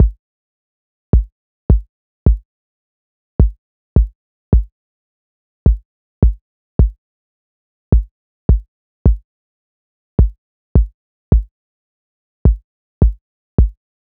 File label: 106bpm Kick